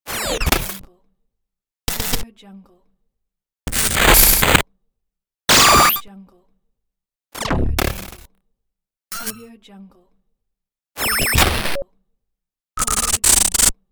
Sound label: glitch; welltone